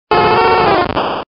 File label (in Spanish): Grito de Vulpix